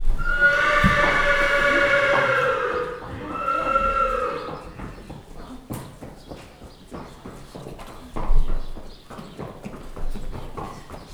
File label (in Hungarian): több nyihogás lovat kivezetnek, istálló; termeszetben